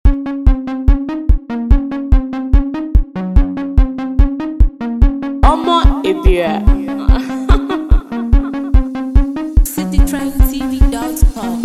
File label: Beat